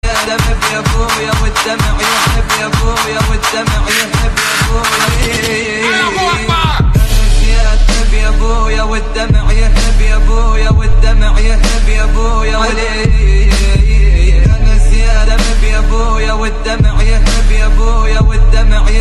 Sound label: arabic instrumental ringtone